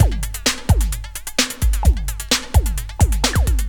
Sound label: drt 130 electro, DRUMLOOPS, LOOP ELECTRO; Ueberschall; Techno Trance Essentials